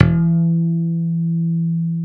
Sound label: E2 HARM, STIK BASS; D-STIK BASS1